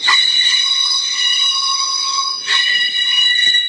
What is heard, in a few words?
subway sound; UNUSUAL KITS and FX; Subway Sound Construction Kit